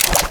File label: frag grenade pickup ammo